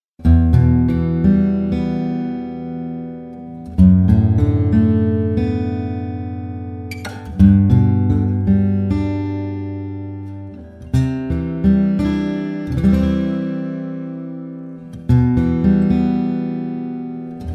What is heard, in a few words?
Pau Ferro G80, guitars